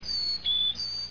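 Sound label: Carolina chickadee, birds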